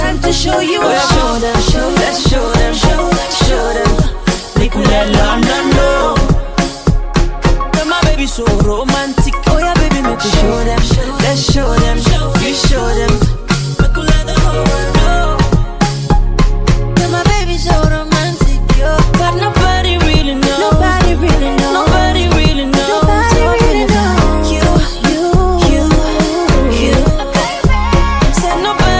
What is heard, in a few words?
Romantic